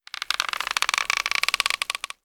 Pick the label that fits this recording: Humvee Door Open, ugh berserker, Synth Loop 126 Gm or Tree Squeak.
Tree Squeak